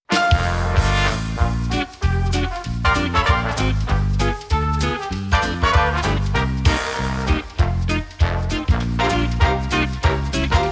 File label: Instrumental, kassetten